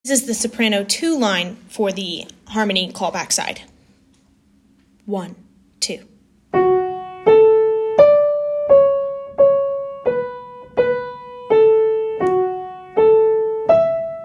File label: Soprano 2 Line Harmonies